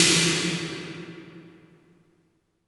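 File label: snare drum hall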